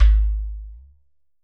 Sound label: GHATAM